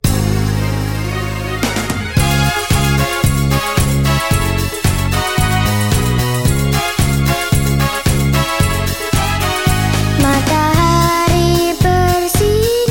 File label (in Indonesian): Lagu Bangun Pagi